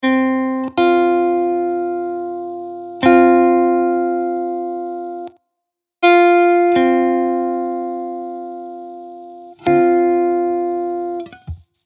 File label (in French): son interv quarte juste, guitare